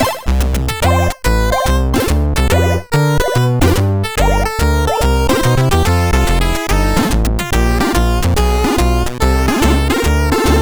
Theme, CSG8580R5; sid

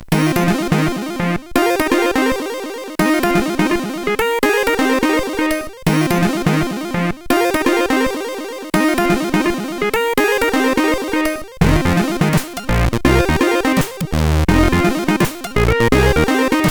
Blue Blues, CSG8580R5

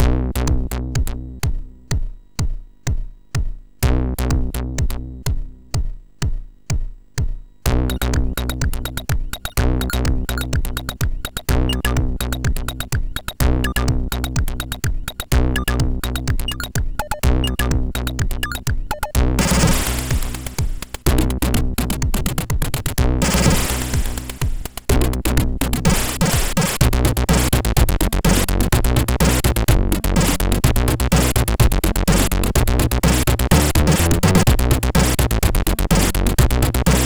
Techno House, MOS6581R3

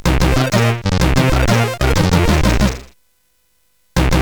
Orcus dark lord, CSG8580R5; hvsc

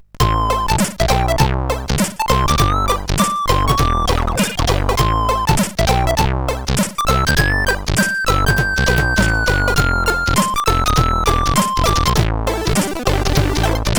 Swing, CSG8580R5; Sister Swing

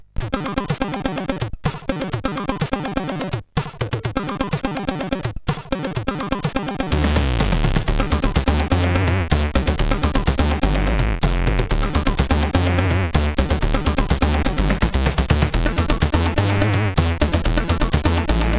Funk, sid CSG8580R5; Cosby Funk

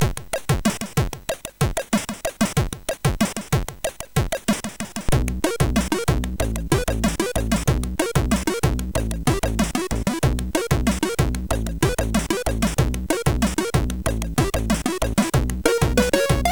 Gloomy, MOS6581

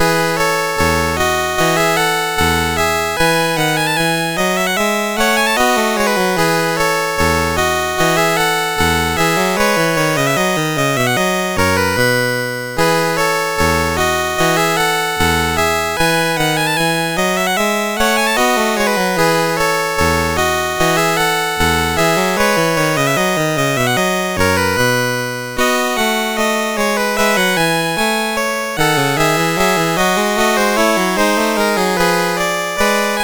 Gavotte, CSG8580R5; Joseph A Grau; Sixth French Suite

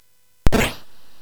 Space Gun

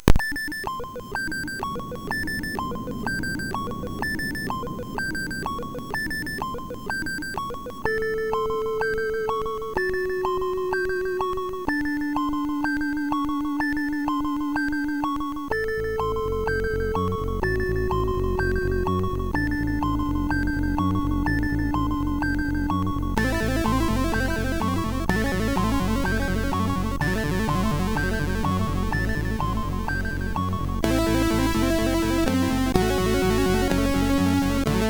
Horror Song, MOS6581R4

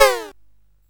Dark Castle, CSG8580R5

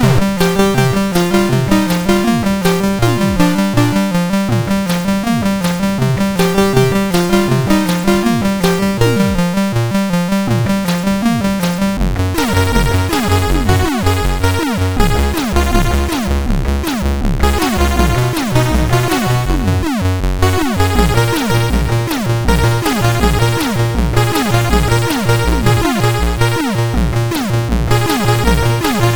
RCS Music Demo, MOS6581R4

